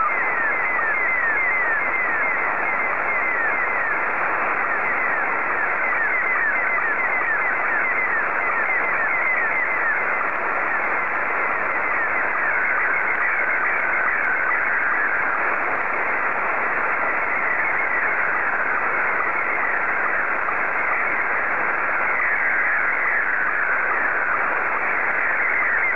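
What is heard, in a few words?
SSTV, Scottie 2; JA3OEN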